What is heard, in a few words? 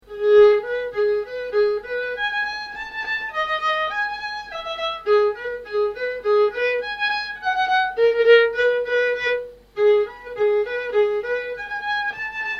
Polkett solo